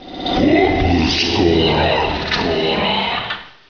Weird Noise

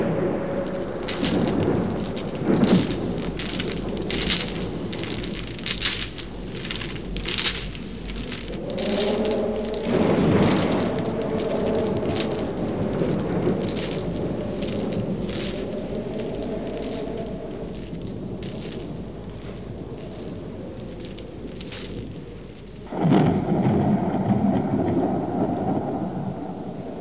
Chains Thunder

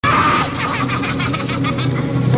cackle

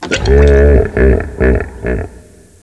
HA HA Deep; short

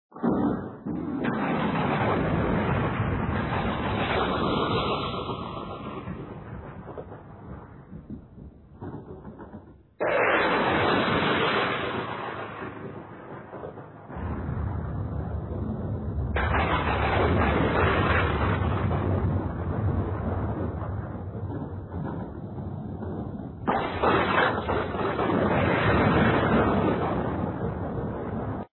Thunder Storm

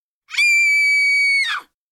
Scream female

